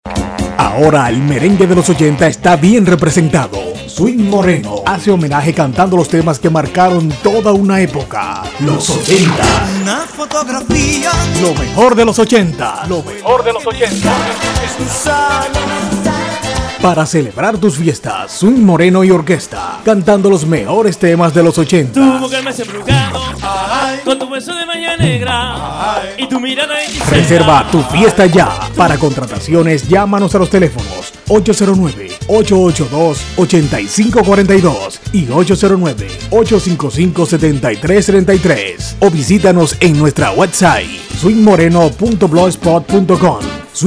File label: SWING